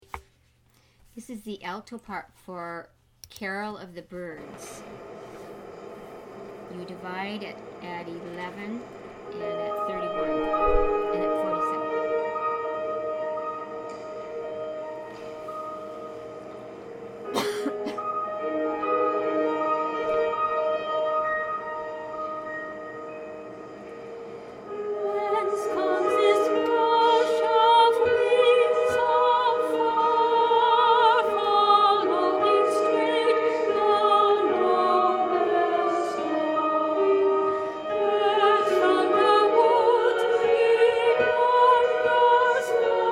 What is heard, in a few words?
carol of the birds